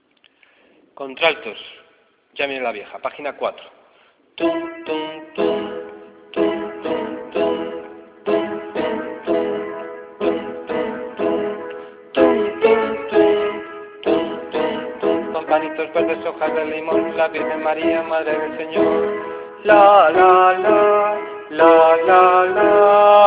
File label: altos